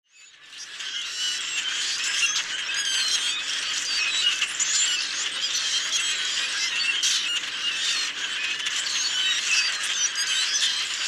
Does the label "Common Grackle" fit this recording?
Yes